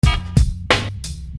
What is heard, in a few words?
Beat, Hip-Hop Street Beats